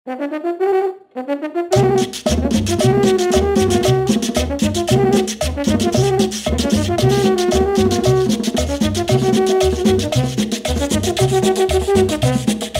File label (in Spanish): cumbias solo cumbias